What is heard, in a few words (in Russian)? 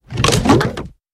zvuki bochki